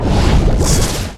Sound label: door; Shin Megami Tensei IV Apocalypse; 3DS